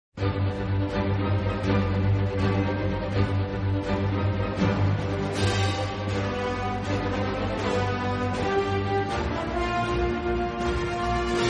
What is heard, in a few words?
Instrumental Bgm; Marvel